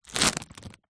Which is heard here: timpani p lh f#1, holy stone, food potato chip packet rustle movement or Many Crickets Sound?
food potato chip packet rustle movement